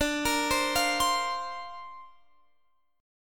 D-Minor 7th Sharp 5th D, chord; Dm7#5 arpeggiated; x,17,20,17,18,20

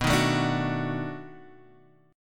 B 7th Sharp 9th, down

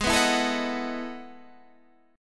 E Major 11th chord

